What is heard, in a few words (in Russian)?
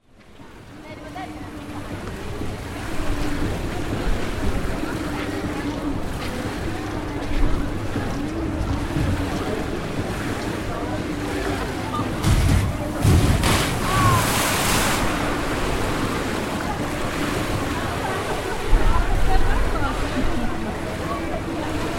zvuki akvaparka